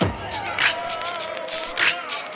biz basic bits, Beatbox, Drum loops

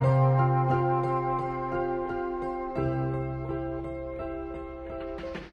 guitar, Loops, Sad

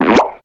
squeeze cartoon

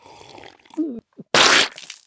spit take

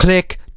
clack, Voice; ooh ahh

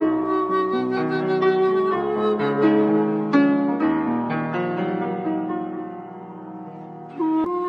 flute, Loops

